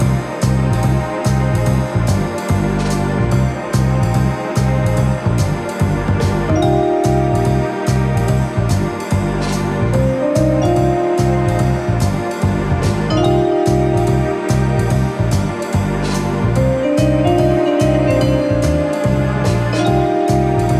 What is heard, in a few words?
urban dungeon bpm145, Music for dungeon theme. This music is loopable.